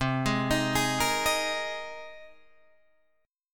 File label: C Minor 7th Sharp 5th C Guitar Drop D